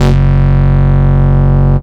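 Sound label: BASS OBMX, D8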